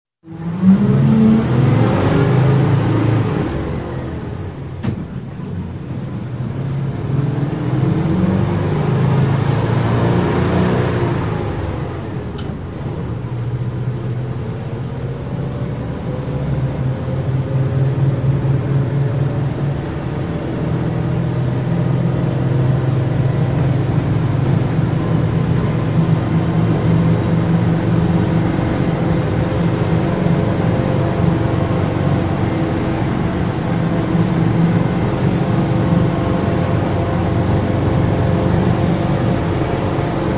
Bus Exterior Steady Through Gears; Traffic, Sirens, motors

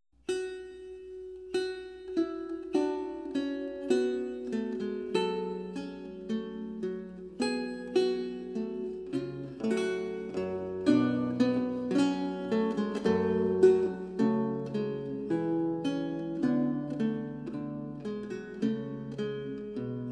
Recercar, on renaissance lute

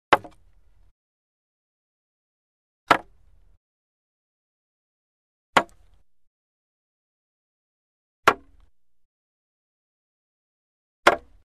Wood Staff Hits Medium Single Medium Wood Hit; Hollywood Edge Foley Sound Library

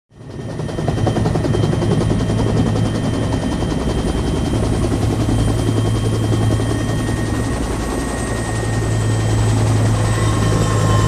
Helicopter Land Close & Wind Down; Planes & Trains

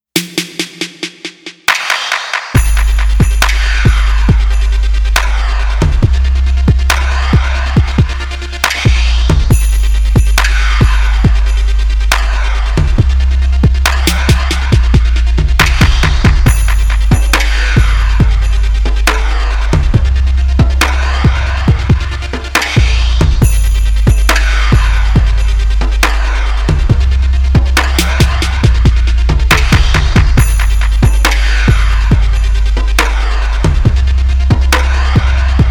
Rain (Instrumental)

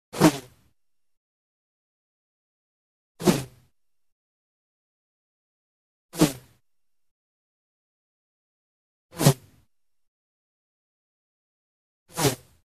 Arrow Whistle Bys Heavy Arrow By Fast With Whoosh; Hollywood Edge Foley Sound Library

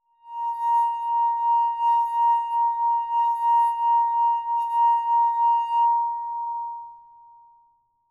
Ringing Rim; SOUND EFFECTS1

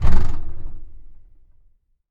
WEIGHTSCAL; TOOLS

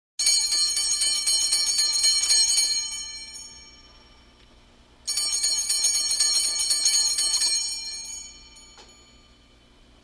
Hand bells; SOUND EFFECTS1

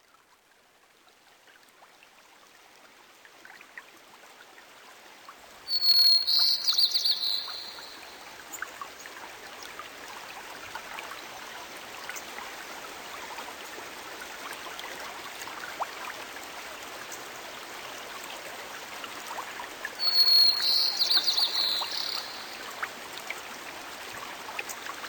Forest Guitar; Clean And Clear; Dan Gibson

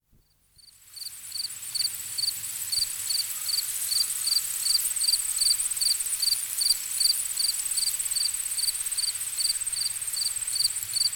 Crickets and Frogs In the Distance; Dr. Sound Effects; 210 Nature Sounds - 20 Hours of Relaxing Natural Ambiences for Meditation and Sleep

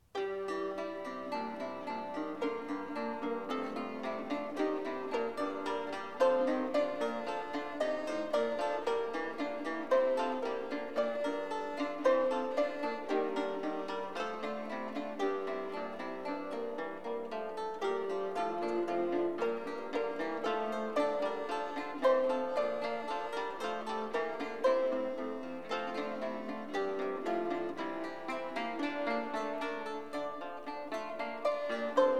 Instrumental

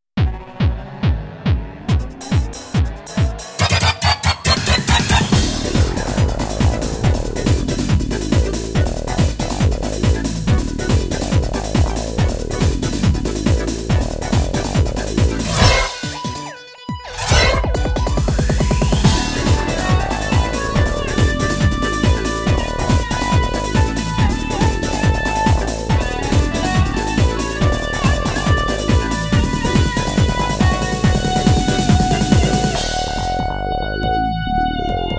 Techno

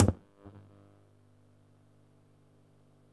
AMP NOISE; 1000fx

